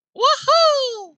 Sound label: happy slime; SlimeoosOdyssey; godot